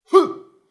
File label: Boxing fight vocalization male grunt